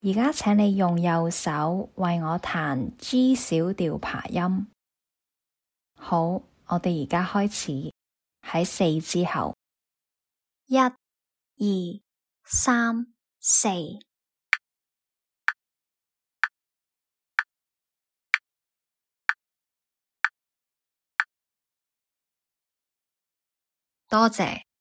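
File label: G minor arpeggio right hand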